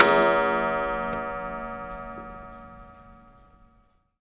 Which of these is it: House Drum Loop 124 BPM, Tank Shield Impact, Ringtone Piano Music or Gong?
Gong